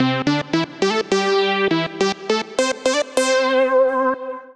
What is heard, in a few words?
Lead (C) 105bpm; Music Loops; Radio Lead; Ableton; Factory Packs; Singularities